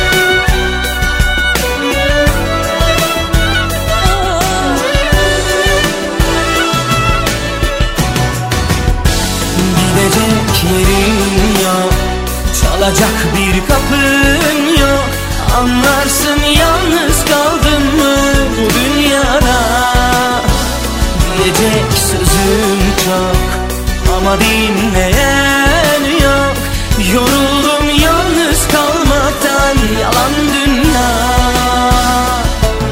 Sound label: Ringtone Mobile